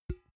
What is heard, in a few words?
empty can damped hit